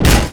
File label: vehicle impact medium; v8; Portal 2; vehicles